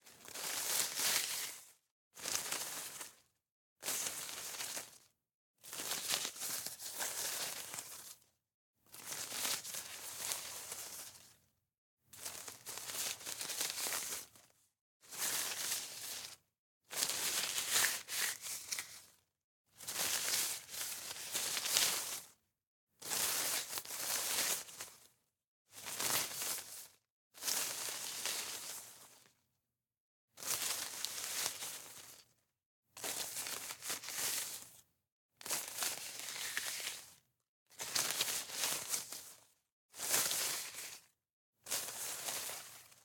RUSTLE Studio performed green leaves twigs brush moves; Rustle Tones